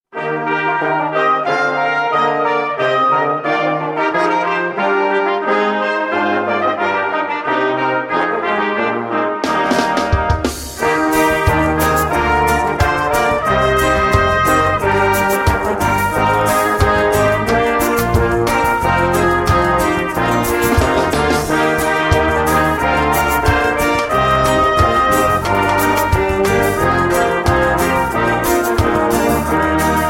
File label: Brass